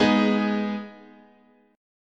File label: Ab Minor, guitar chord